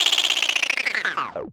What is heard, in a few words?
Transition Spin Down Descend; Production Element; Title Transition; AdobeCC